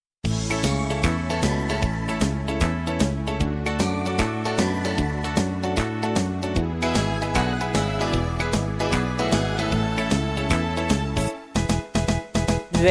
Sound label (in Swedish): jingel